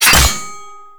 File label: Crafting Equip; Cetus